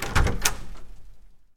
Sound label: door open; Golden Crayon Sounds